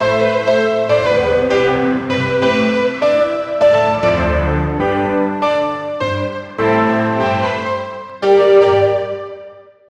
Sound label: sad melody